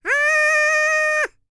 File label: PERSIAN, World Voices; ILIO; Vocal Planet VOL-5